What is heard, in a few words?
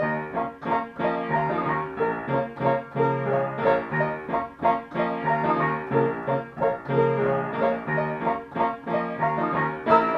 secret waltz